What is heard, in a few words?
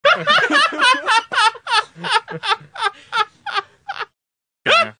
Weird laugh